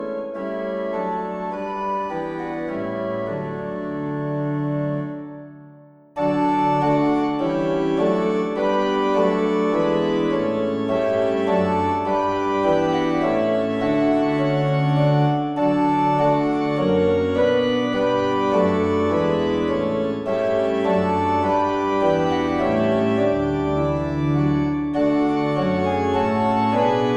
Organ

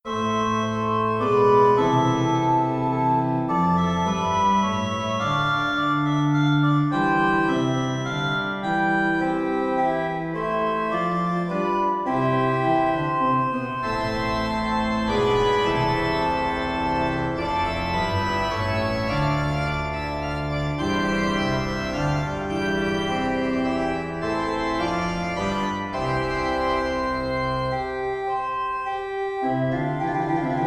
Organ Minuet in A Minor; Organ Miniature; Georg Philipp Telemann; Suite in A Minor

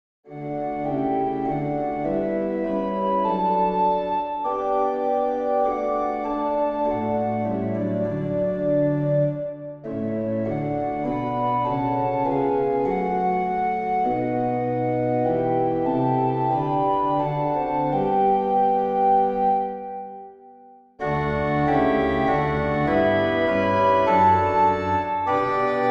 Organ

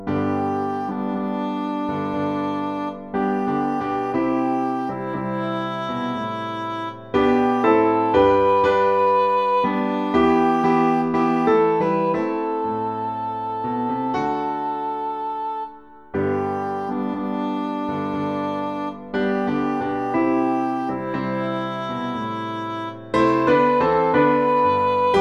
Piano and English Horn, extract

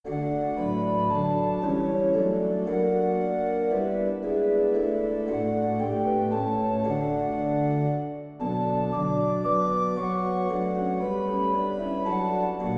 Organ

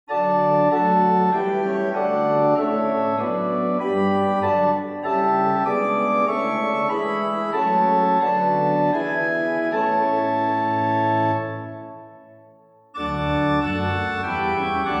Organ

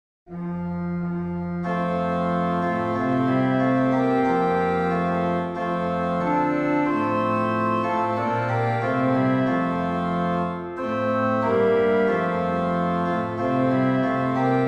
Organ and Trumpet Descant

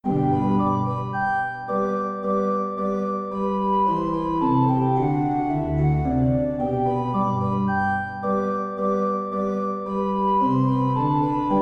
Organ Minuet in G Major

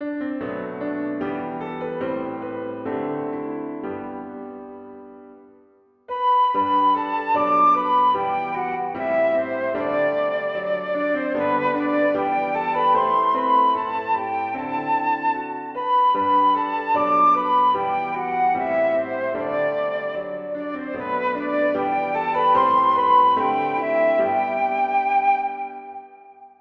Piano Ensemble, hymns without words

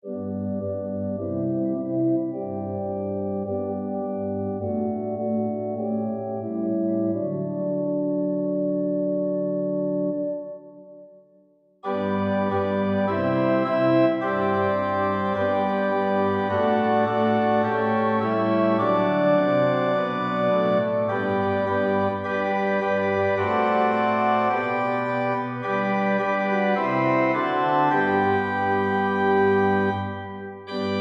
Organ

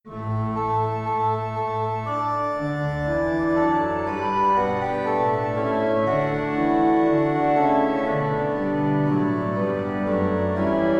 Organ

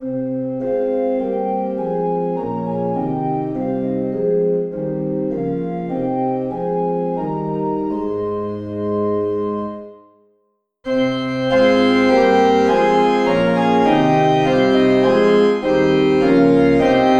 Organ, hymns without words; Arise and sing a joyful song; Morning Song

